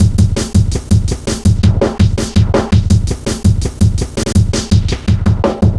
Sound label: Hard Edged, Drum Loops, 165.5